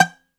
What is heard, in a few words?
BOUZ PIZZ G 1